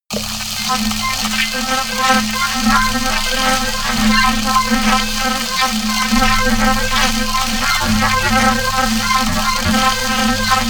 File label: atmosphere crazy didgeridoo high